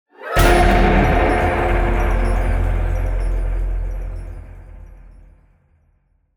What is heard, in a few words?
Chime Tunnel; Gothic Storm Music